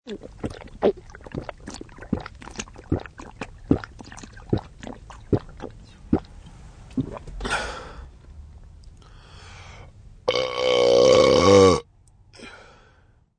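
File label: Drink Burp, Bottle of water slammed then major burp; Pounding Water and Big Burp; Burping (Sound Effects:Human)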